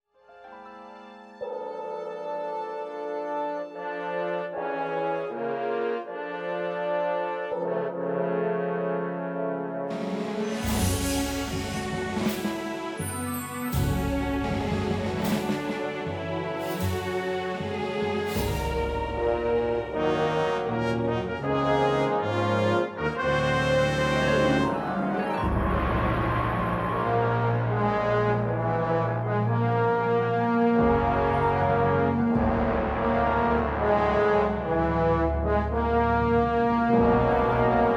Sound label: Dungeon; Prague